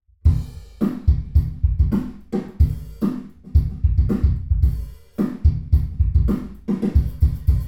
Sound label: drums binaural